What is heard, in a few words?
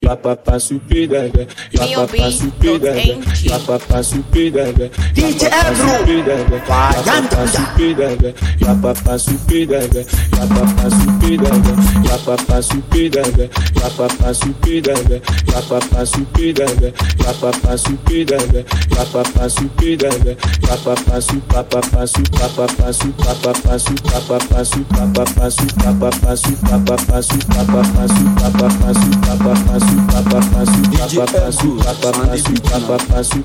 Refix Beat